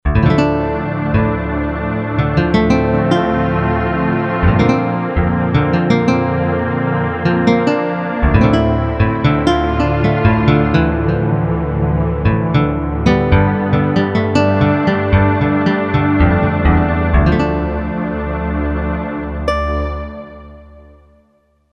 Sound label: guit pad